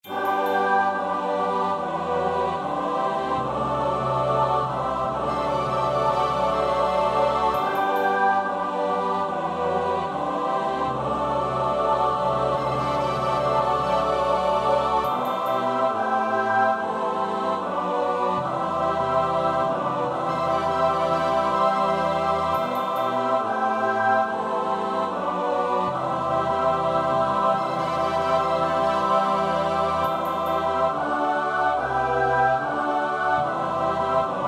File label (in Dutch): S-A-T-B, koorpartij oefening; Orff (Carl); Carnina Burana